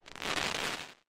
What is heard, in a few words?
crackle